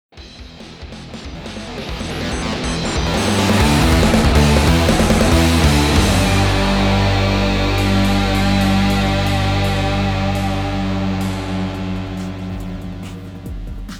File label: Result Win; the king of fighters xv